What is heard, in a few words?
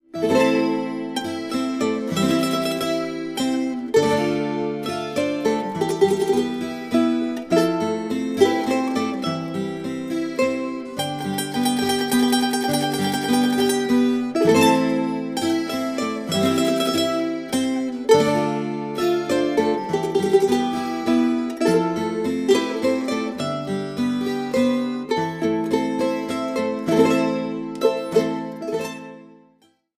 cristal